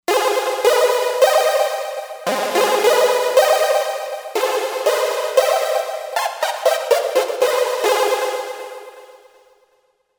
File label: Mod Chord